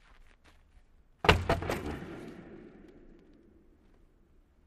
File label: Hockey Player Stick